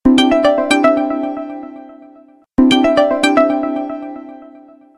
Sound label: email notification sounds